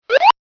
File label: stomp